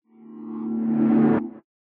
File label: Combat Balcony Start; Valravn